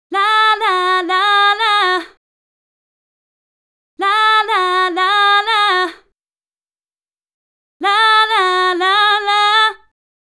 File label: 125 Gbm BVs mid